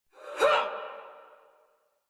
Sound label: Attack Effort; Warframe; Dax; Ranged